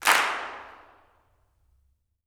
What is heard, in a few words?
CLAPS, Gospel; ILIO; Vocal Planet